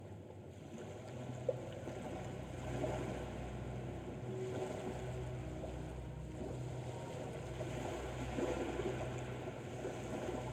Binaural Beats Brain Waves Isochronic Tones White Noise